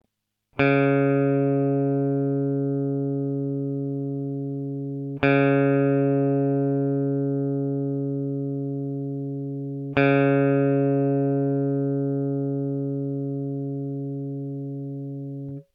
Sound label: db tune